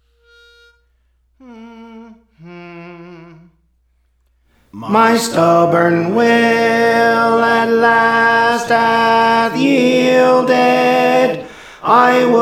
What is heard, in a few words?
Tenor; Praise For The Lord Training; My Stubborn Will at Last Has Yielded